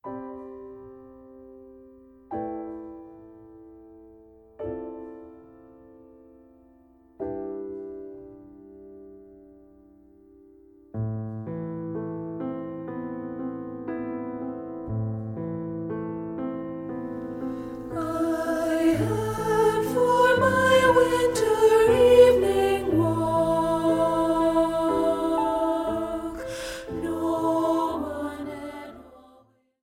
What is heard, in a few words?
A Winter Eve SSA (Alto Dominant)